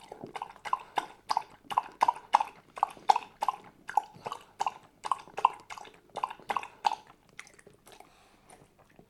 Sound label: Lapping